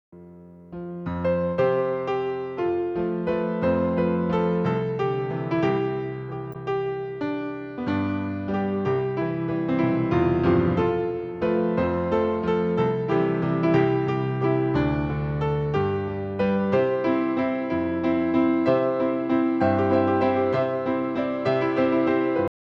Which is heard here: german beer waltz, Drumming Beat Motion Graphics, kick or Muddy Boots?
german beer waltz